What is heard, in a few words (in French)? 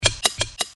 bruitages crab or scorpion